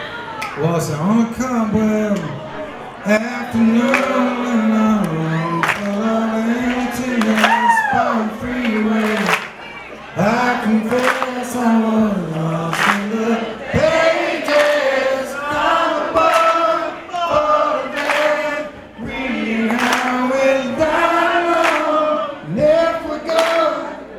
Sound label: (Acapella); The Roxy, West Hollywood, CA